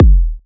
Techno Kick

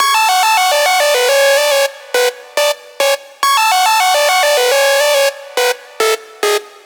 Riddim Melodic Loop F# 140 Virus; Ghosthack; Riddim Essentials Volume 2

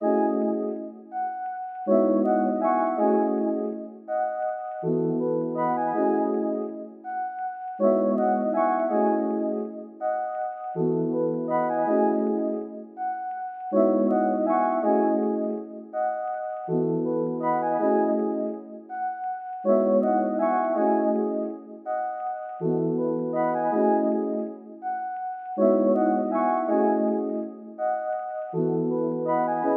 162 BPM C# Min Electric Piano; Melody; RnB; Loop Stems; Cymatics; 2022 Melody Collection - RnB Pt 1; Goddess

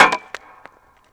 Hockey Puck Goal Post Hit; Sports & Recreation

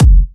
Kicks

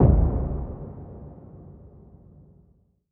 Cinematic Impact; FX; Dungoen

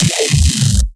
Dubstep Growl, D#; Synths; One Shots; Cymatics; Dubstep Starter Pack

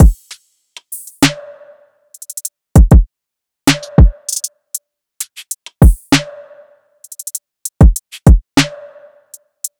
Side Drum Loop, 98 BPM; Cymatics; Vibes II Drum Loop Collection

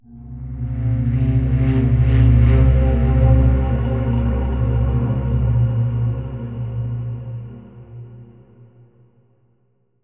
Dark Flutter - Cm 60Bpm; FX; Construction Kits; STEMS; Ghosthack; SkyRealm; Kit 06 - Before The Storm

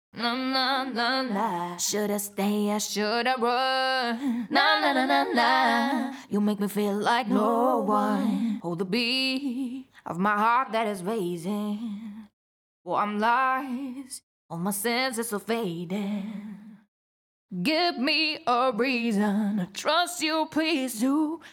CLEAN ACAPELLA (AbMaj), Pop Vocals; HEART BREAK; Acapellas

